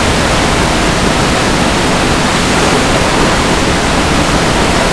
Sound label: watrfall; The Elder Scrolls III Morrowind GOTY; XBOX